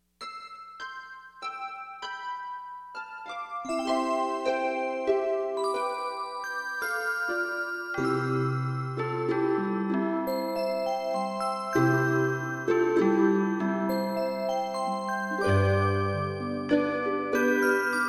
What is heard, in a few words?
silent night Bell; Christmas